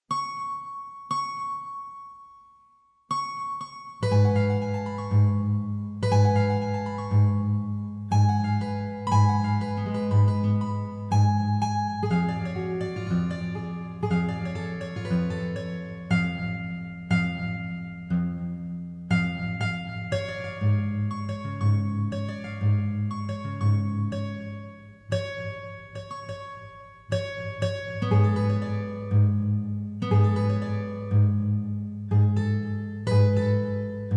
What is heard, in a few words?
Guitar